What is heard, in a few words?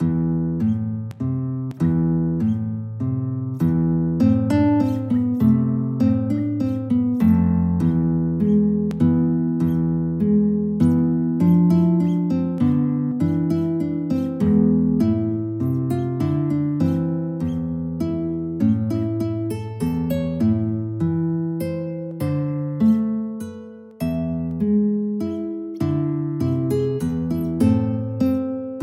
Waltz, guitar